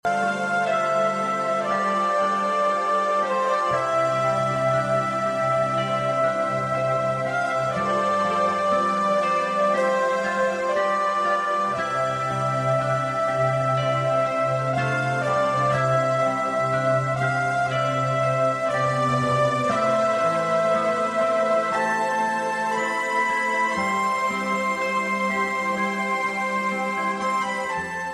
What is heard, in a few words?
soothing music tone ringtone